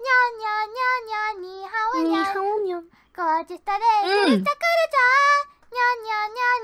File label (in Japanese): アカペラ; 中華料理レストランチェーン娘娘CF